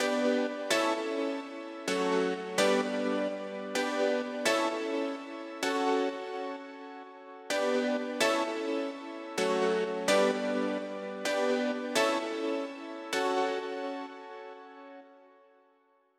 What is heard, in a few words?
128BPM Piano Root G, Tech House; Full Effect; Vengeance Samples; Bonus TechHouse Kits